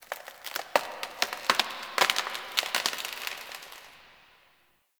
Zariman Wood Creak; Warframe; The New War; Ambience

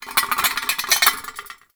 Metal Rattle; Warframe; Tau; Ambience

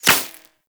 Laser Impact Default; Warframe; Bullet Impacts

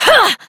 Exert Adult, Melee Swing; New War; Operator

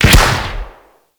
Grenade launcher Shot; Warframe; Weapons; Corpus

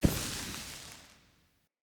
Energy Restore Spark Burst

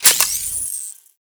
Pistol Reload; Operators; Drifter

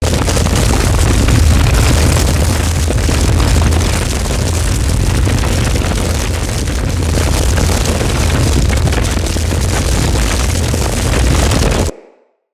Arch Wing Movement Burn Loop; Vehicles

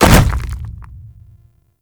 Golem Step Default; Warframe; Footsteps

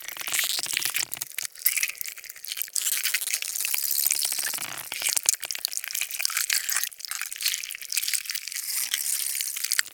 Nexifera Return Loop, Hunting Critters

